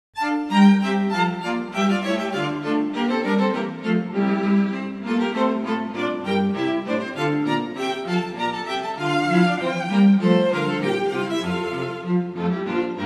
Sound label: Bourree, string quartet; Handel